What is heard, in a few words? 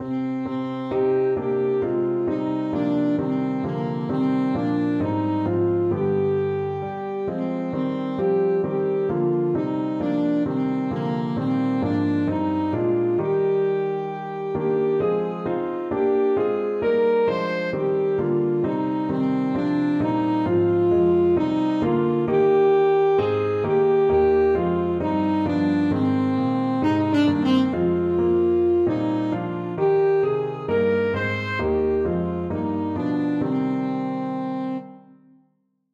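alto saxophone; god rest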